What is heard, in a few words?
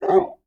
Animal Mammal Carnivore Dog Labrador Retriever Bark; animals; AdobeCC